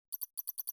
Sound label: hover menu